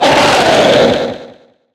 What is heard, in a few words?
Btl Foecry